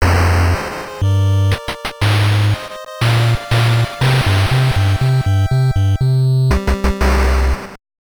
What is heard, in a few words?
Versus Win; tetris 2 nes